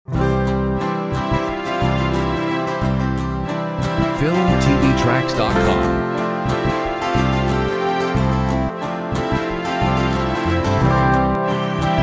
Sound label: Stand Proud short mix without drums